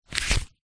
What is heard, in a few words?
household book cub scouts record small page turn; zapsplat